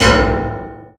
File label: Menu Select